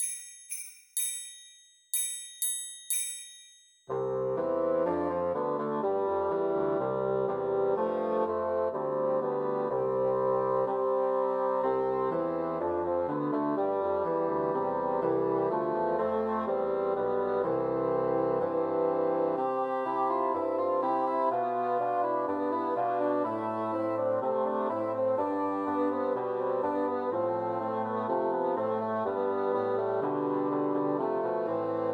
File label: Ding Dong Merrily on High Christmas Trios for Three Bassoons